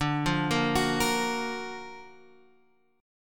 Gb Augmented Major 7th D

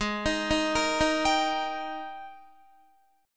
Ab Major 7th Suspended 2nd Ab down Guitar; arpeggiated; JGuitar

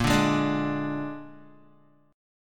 A sharp 7th A sharp, Guitar; strummed; chordshape; 5,5,8,7,8,x

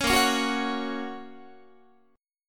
A sharp Minor Sharp 5th, down, Guitar; strummed; JGuitar

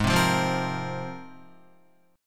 Ab 6th Add 9th Ab, Guitar; 3,3,5,5,5,6; Ab6add9 strummed

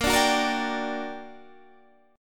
G Minor Major 7th, A sharp, guitar chord

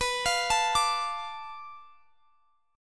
D Minor B, chord; Dm/B arpeggiated

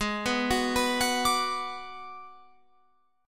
Ab Minor 7th Flat 5th Ab, down, Guitar, Open F; Abm7b5; arpeggiated

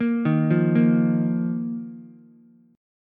Bb Suspended 4th Bb down Ukulele; Bbsus4 arpeggiated

